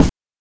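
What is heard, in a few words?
BRK BD, bass drum; NES DPCM; MIDINES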